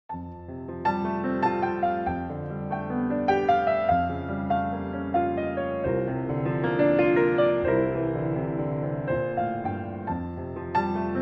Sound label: Sacred Arrangements For Piano